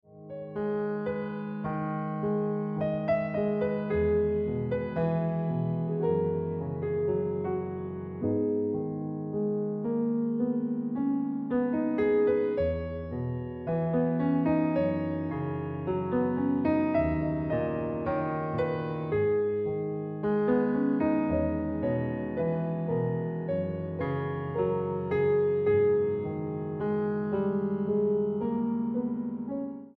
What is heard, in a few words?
Ringtone